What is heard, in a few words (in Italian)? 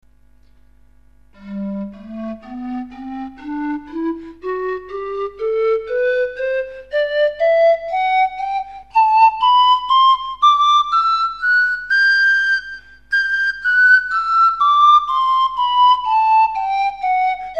basso